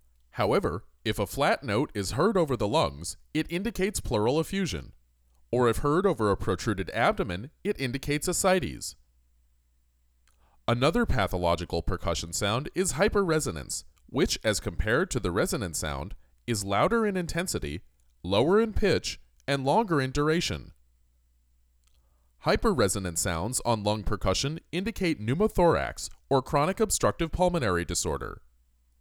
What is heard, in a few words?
Percussion